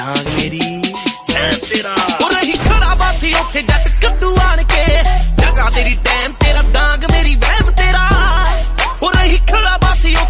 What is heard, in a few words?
Punjabi Ringtone; ringtones